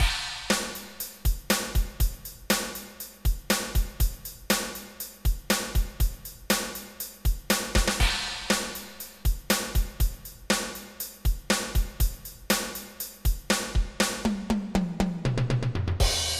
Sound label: 8th rock